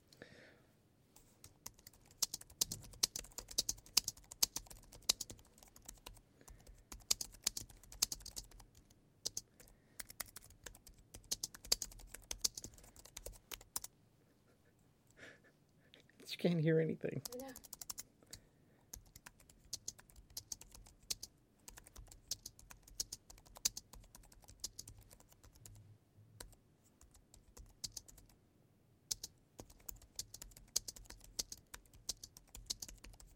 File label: Apple USB Keyboard